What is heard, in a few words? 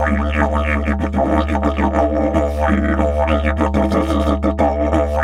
Didge 93 BPM, Didgeridoo